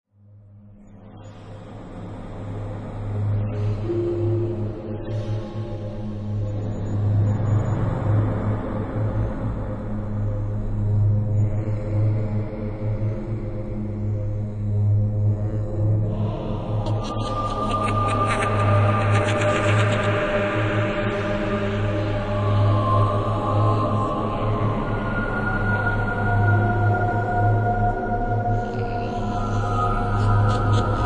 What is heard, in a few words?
halloween music